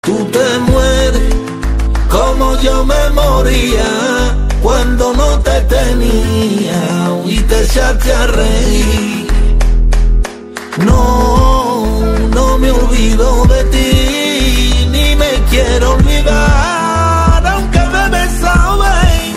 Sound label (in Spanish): Flamenco